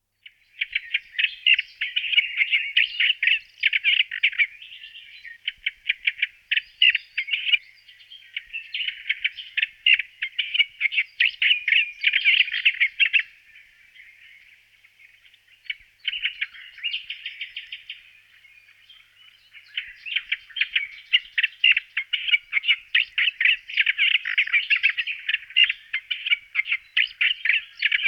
Sedmihlavek olivovy Hippolais olivetorum, nasiptaci

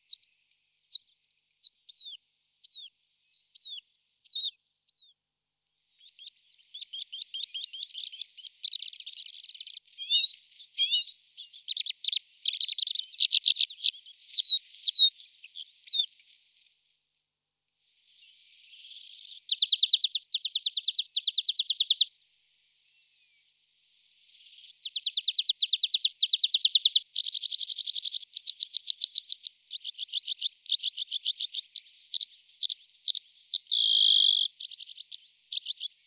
Linduška rudokrka Anthus cervinus

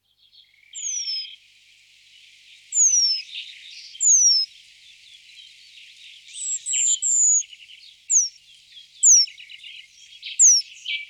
Moudivlacek luzni Remiz pendulinus

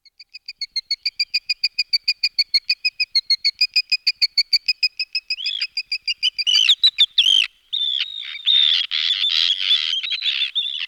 Rybak dlouhoocasy Sterna paradisaea

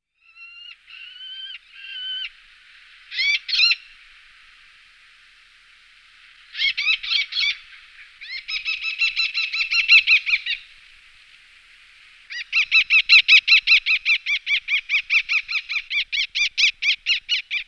Ostriz jizni Falco eleonorae

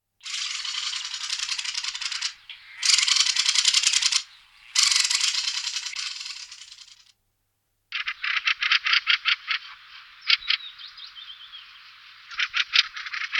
Drozd bravnik Turdus viscivorus

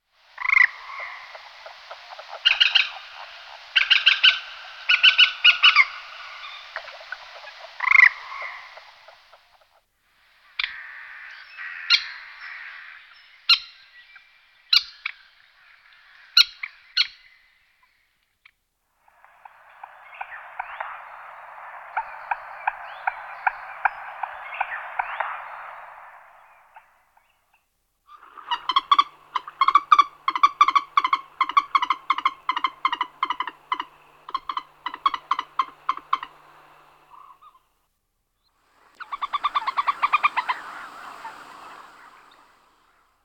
Slipka zelenonoha Gallinula chloropus

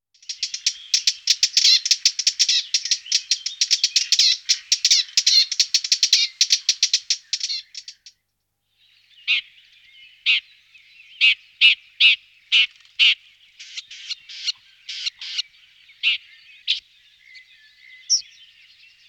Tuhyk obecny Lanius collurio

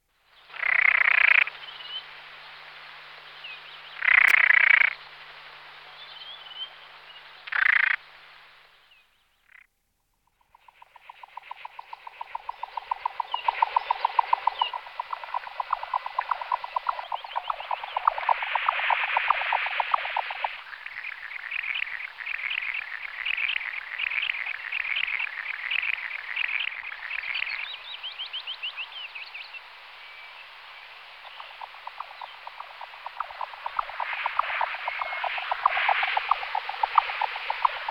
Slucka mala Lymnocryptes minimus, nasiptaci

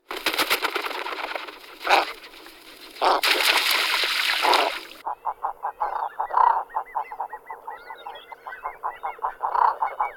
Kajka morska Aythya mollissima

